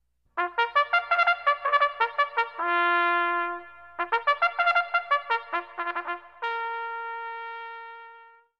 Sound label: trumpet man